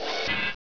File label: run right foot; rustbit; svencoop